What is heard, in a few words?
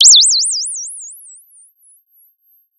Sound of a bird tweeting nicely